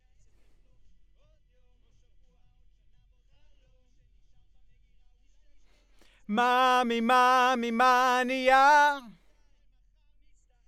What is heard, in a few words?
B. Vox